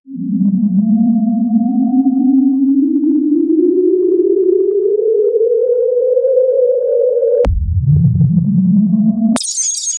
the sound of transcending, otherworldly

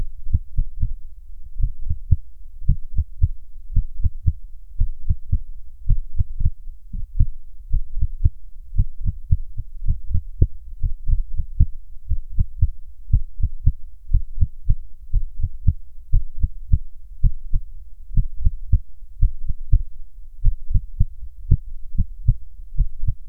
hyper realistic internal, human body

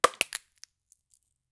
chocolate breaking low de

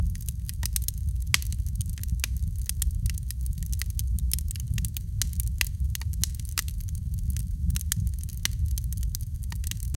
seamless looping, atmosphere

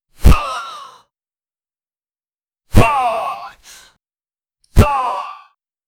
fight

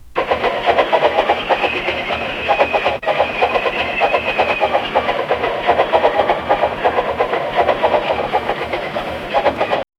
vintage steam train chugg

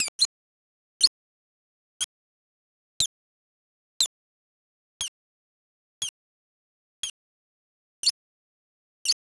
chiptune pin shoot square

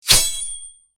short single sword slash

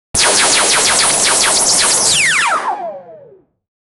laser beam weapon

